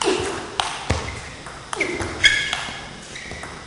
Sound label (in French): BRUITAGE PING PONG